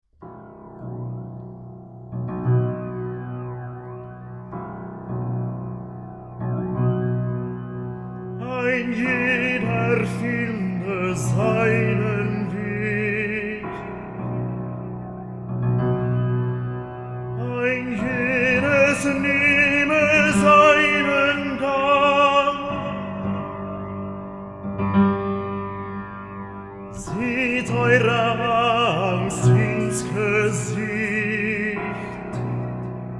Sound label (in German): Das Böse mit Gesang